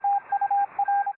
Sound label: message; OpenFrontIO